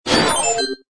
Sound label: Bonus Catch Ping; breakawish